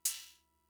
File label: Cymbal, Fricke MFB-522, Drum Machines